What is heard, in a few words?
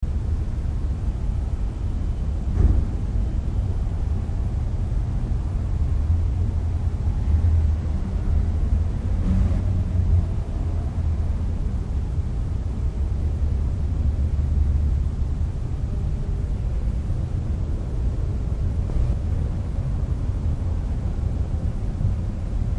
library atmosphere